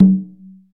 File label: percussion congas